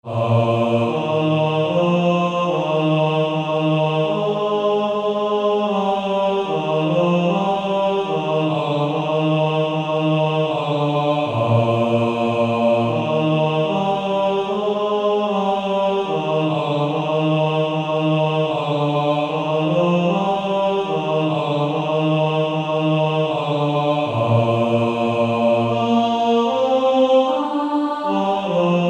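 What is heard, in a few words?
Male Choir bass 1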